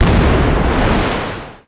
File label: ship miss big effect; Hearts of Iron IV Death or Dishonor